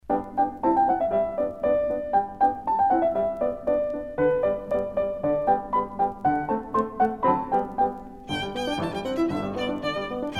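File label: violin sonata; weber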